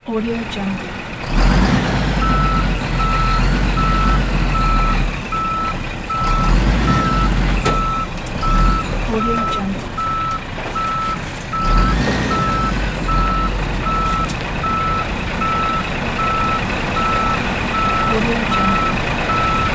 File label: truck reversing